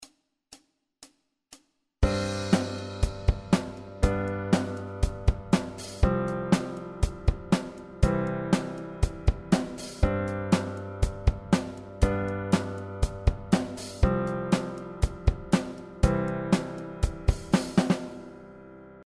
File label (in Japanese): sus4解決